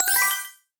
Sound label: attention Sound; magic maid cafe